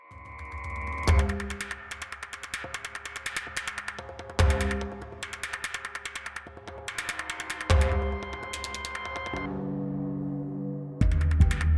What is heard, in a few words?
speed kalimba; riot audio